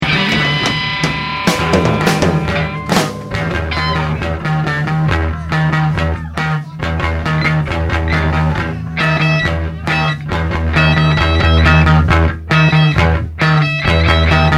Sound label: Instrumental Live; First Avenue Live